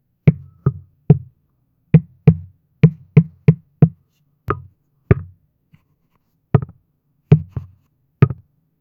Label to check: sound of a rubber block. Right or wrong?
Right